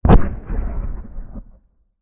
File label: explosion low dist; amb elements; modern warfare 2